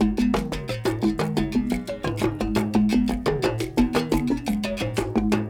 Combination, World Percussion, Xylo, Drones, Shakers, Talking Drums, Stick Drum